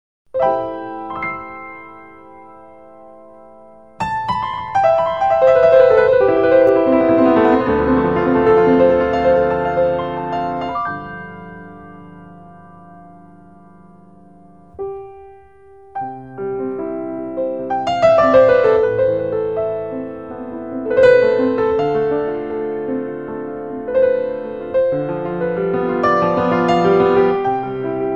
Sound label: Piano Classica Rain